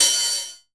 hat, drum; Masterbits - Total Zone

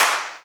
CLAP, Dance Drums

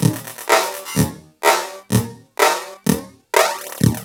LOOP, TECHNO; TECHNO-BOX; ELEC-LOOPS

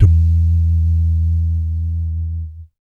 BASS DUMS; Spectrasonics; Vocal Planet; Jazz FX

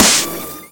SNARE FX, Big Beat; Big Beat Samples & More; AKAI

